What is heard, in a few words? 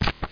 old cards; casino